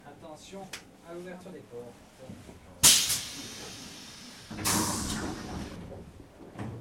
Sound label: Train door opening